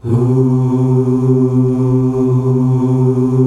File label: HUUUH C, male choir; Best Service; Hyper Dance